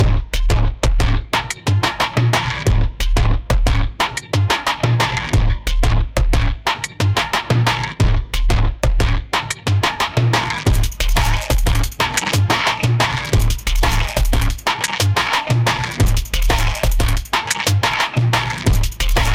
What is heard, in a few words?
tribal hip hop 180 bpm